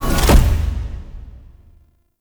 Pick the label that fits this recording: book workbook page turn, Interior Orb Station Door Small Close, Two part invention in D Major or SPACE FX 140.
Interior Orb Station Door Small Close